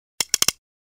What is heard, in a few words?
gun